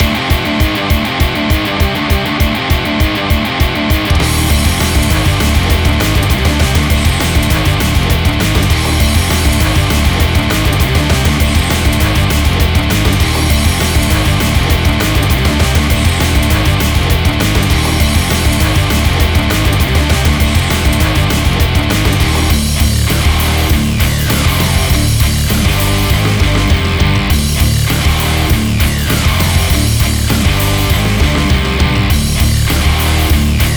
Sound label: neo rock; svencoop; uboa rampage